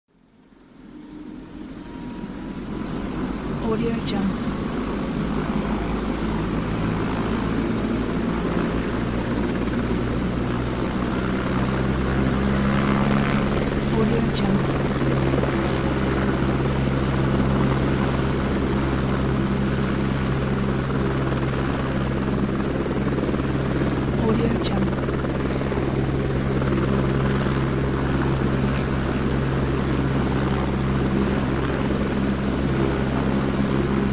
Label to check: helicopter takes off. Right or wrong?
Right